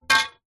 foley metal pole long swimming pool set down concrete; zapsplat